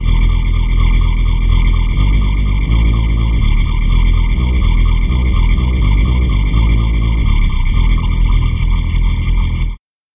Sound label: sweep pad bass